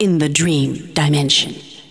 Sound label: VOX, VOCALS; SoundFactory; SMPMANIA